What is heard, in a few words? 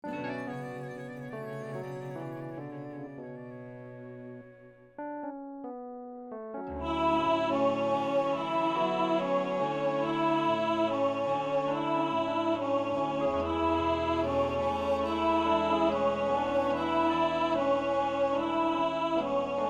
Soprano Alto, rehearsal